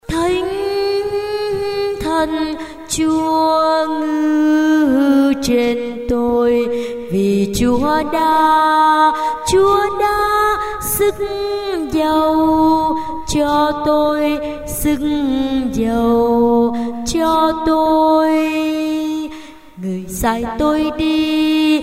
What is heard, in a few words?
basso